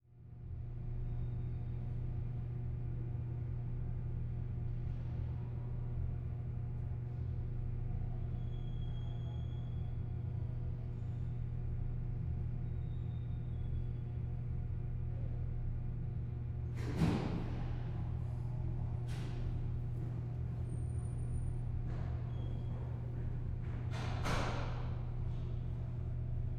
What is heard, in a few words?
Ambience Stairway; AdobeCC